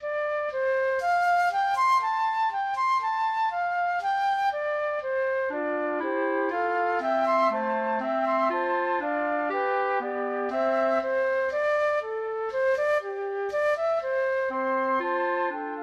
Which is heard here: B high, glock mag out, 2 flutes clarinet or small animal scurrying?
2 flutes clarinet